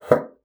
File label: BASSOON; Xsample VOL-6